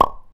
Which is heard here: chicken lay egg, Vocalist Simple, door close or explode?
chicken lay egg